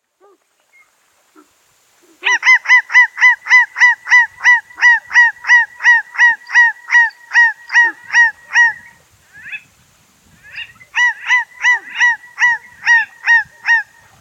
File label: African Jacana Call; Tony Archer